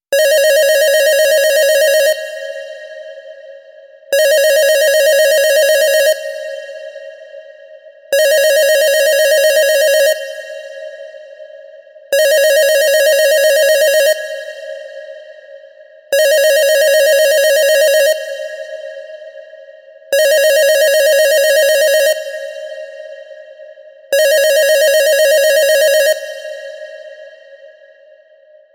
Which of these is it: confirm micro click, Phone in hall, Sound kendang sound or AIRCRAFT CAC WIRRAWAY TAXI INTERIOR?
Phone in hall